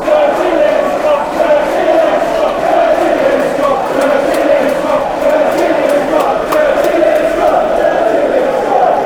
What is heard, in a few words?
dirty leeds, fanchants